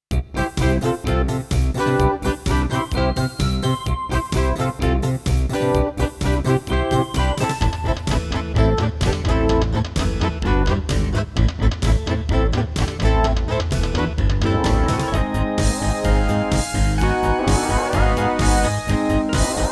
CM500 GS; LSL3

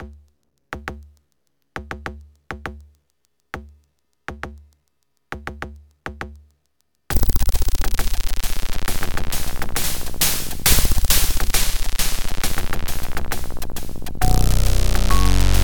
OPL3; DOOM2

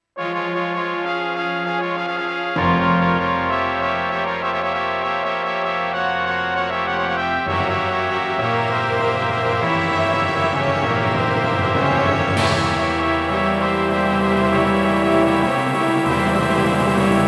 SC880; QFG3

